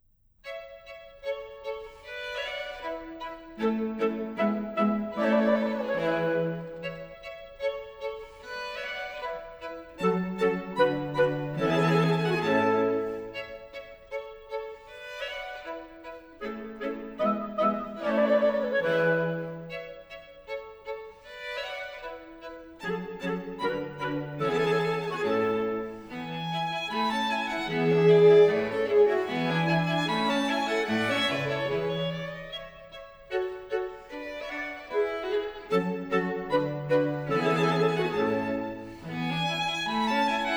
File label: Clarinet Quintet Allegretto; Mozart